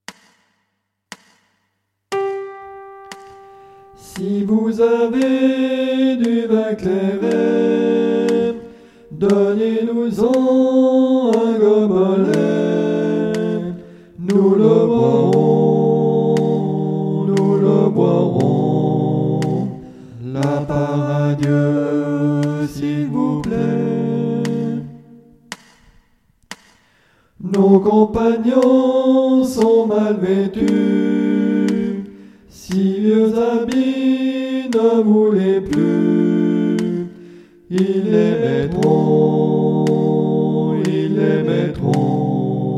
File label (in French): tous, chants